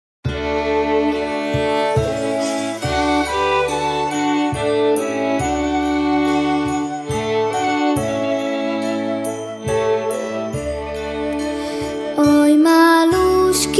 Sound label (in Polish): wersja wokalno instrumentalna